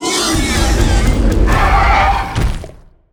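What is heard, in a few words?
boss tolerant death, monster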